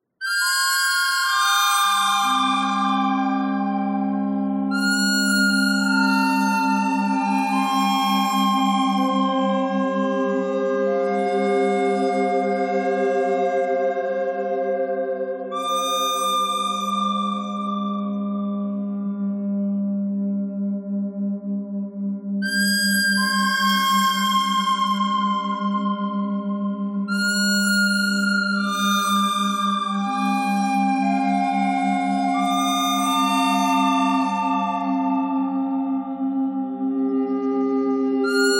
bird thread harmonic; noreverb

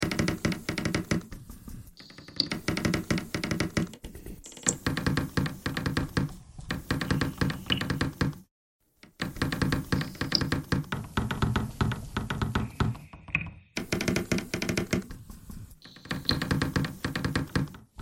bagpipe thread noise

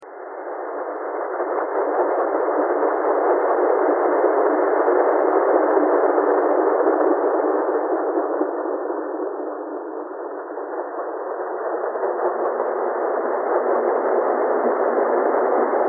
thread buzz

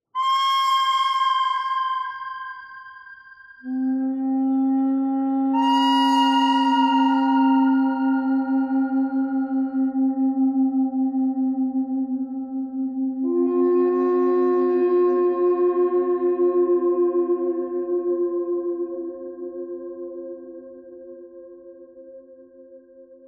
bird thread pentatonic; noreverb

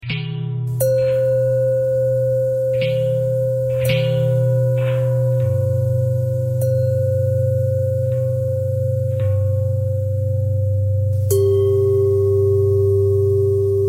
bell long bell threads; bells

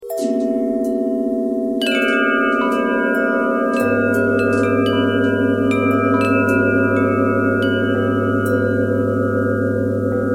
bell twist

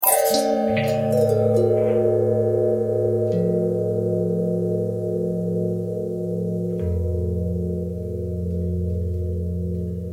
longbell bendtwist

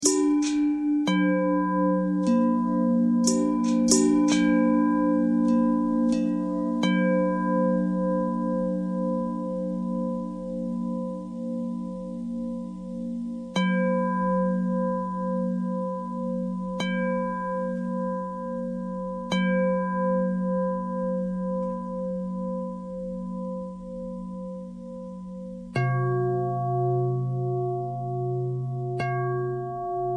bell long bell thread noise, bells

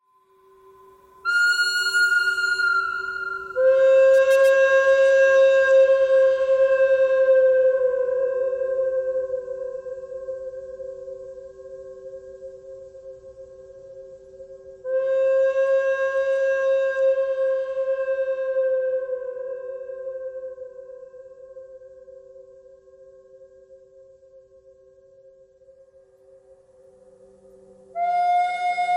birdcry thread simple all; birds

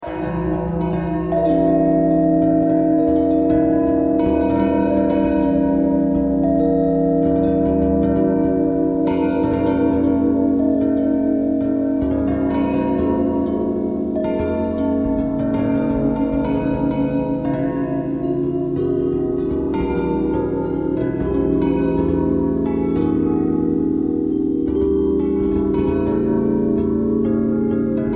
bell bell thread noise, bells

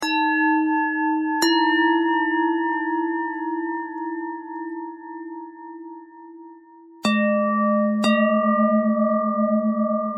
bell thread bend thread

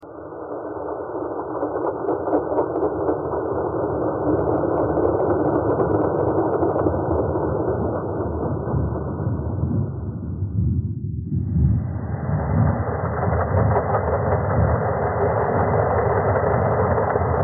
thread harmonic

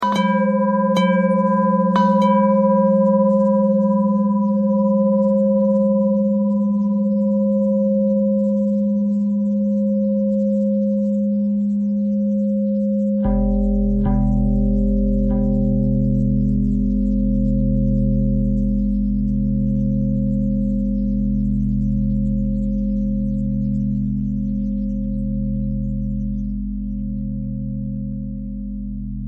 bell thread pentatonic

